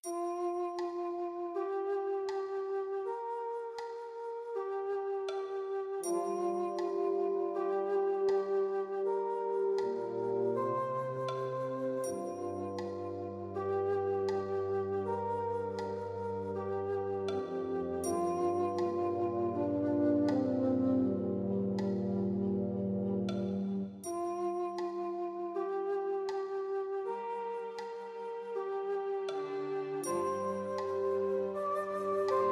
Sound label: oriental MUSIC